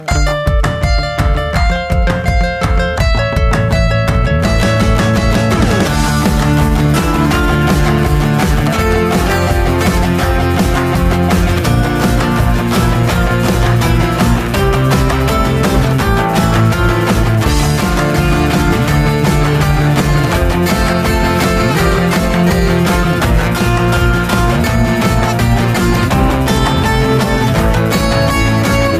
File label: guitar